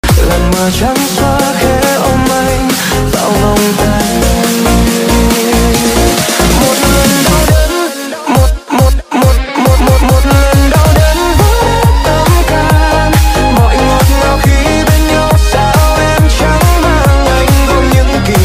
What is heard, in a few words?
remix